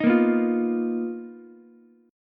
B Major 7th Flat 5th B down Ukulele; BM7b5; strummed; JGuitar

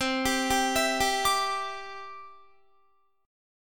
C Major Add 9th, Guitar; Cadd9 arpeggiated

